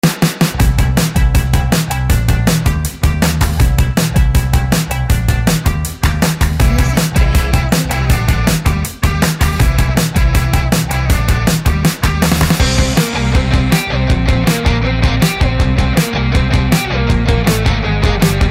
Upbeat Fun Advertising Rock

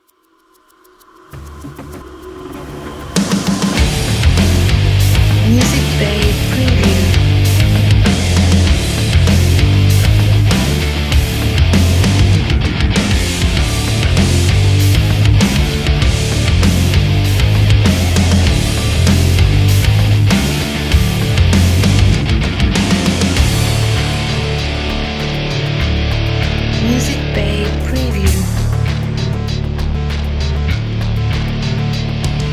Modern Metal

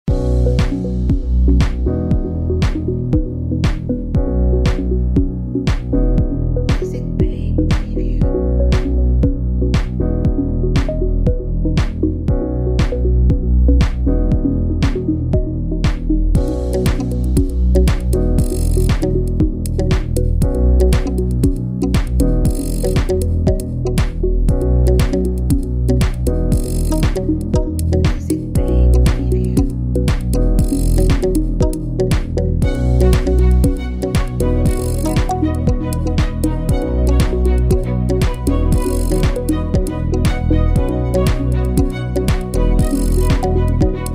Stylish Tech Corporate

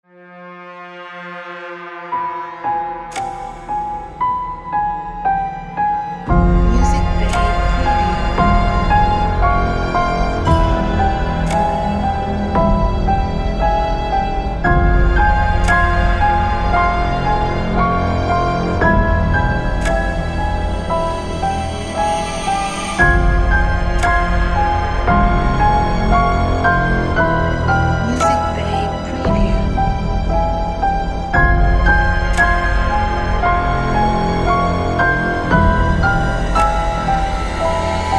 Creepy Horror

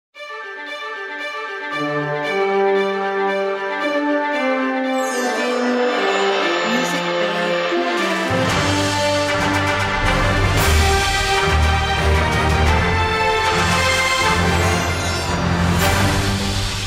Orchestra Logo

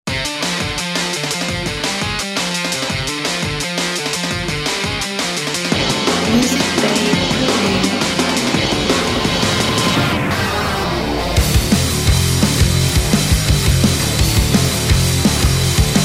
Metal Drum and Bass

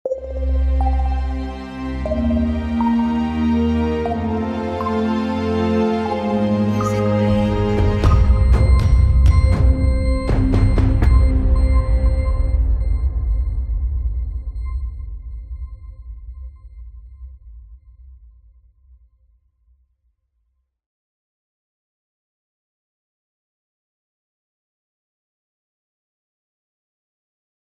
Cinematic Logo

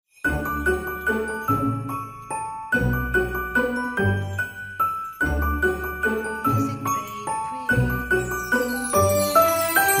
Christmas Advertising Background

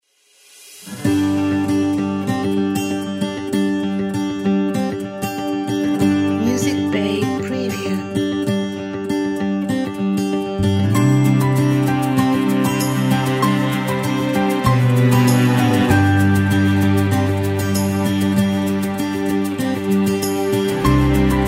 Inspirational Acoustic Background

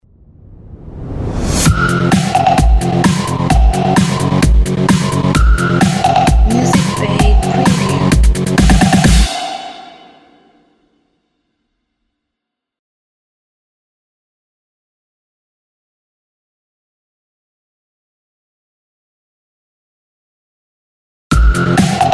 Power Techno Opener

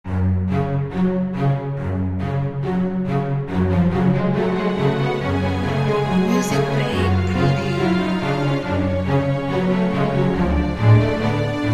Vampire Masquerade Ball

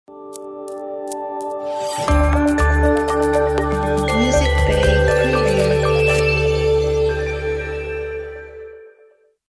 Clean Corporate Logo

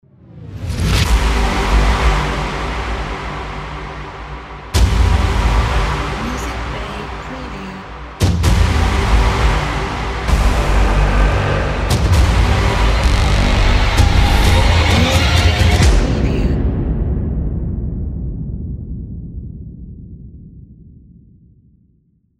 Aggressive Hybrid Trailer

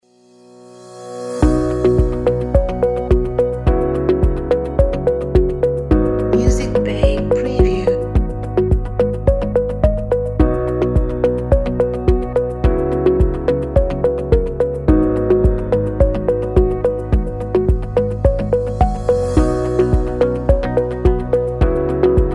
In Peaceful Ambience

